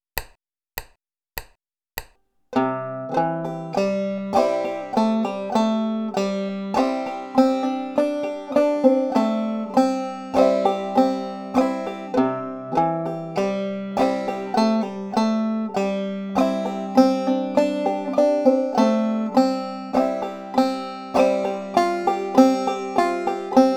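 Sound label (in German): mit hammer on pull off und galax lick, banjo; big liza jane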